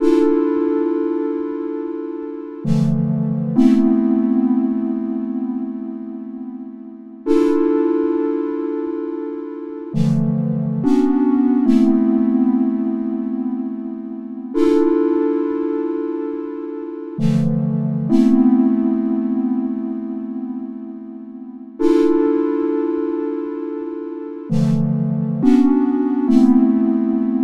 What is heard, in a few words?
Soft Jazz